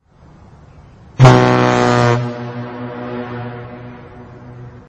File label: Super Horn